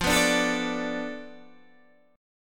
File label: F 6th Flat 5th F down Guitar DADGAD, chord